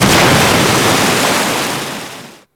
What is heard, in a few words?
Ice Metal Debris Fall; Warframe; Corpus